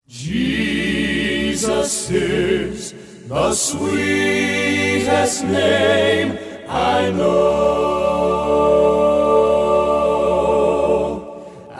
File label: accapella medley